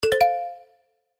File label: chat widget notification; CSX